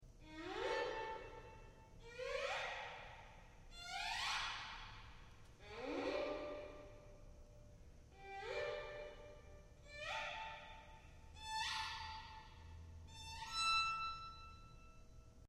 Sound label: Vln gliss set